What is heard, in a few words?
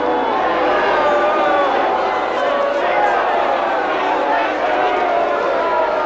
Baseball Crowd Boo Loop; Crowds